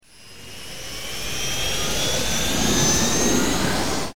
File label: ship move; CosmicRage; ships movement